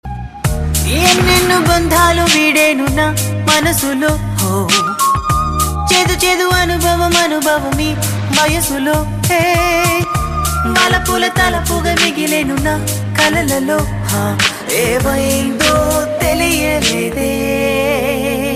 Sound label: xp error remix; ringtones